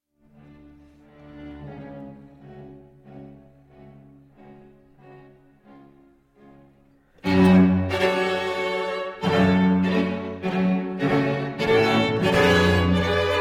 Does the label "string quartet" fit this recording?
Yes